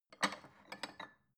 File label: bowl take